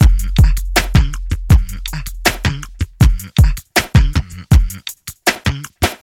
80 bpm drum beat f sharp key, kick tuned to the F# note. Loudest frequency: 1133Hz